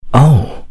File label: Ohh